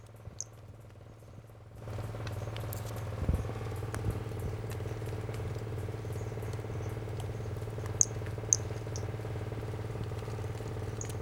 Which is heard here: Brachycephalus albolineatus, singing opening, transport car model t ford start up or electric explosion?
Brachycephalus albolineatus